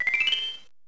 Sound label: Game Boy Advance - Link Cable